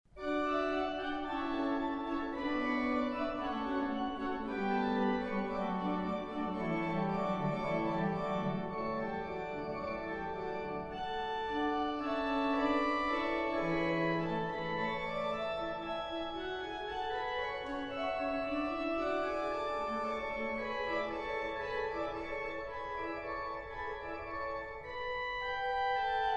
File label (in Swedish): Fuga nr 2 D-dur